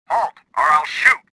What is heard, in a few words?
anger; Star Wars Jedi Knight II Jedi Outcast; XBOX